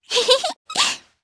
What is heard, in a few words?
Laugh, jp; Hanus; kingsraid